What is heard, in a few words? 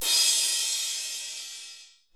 hi cymbal, Kurzweil K2000; Drums